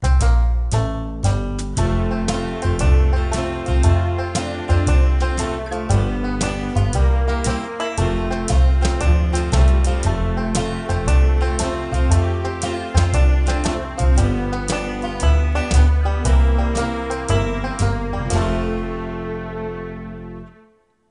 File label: Menu; Mickey Mouse Preschool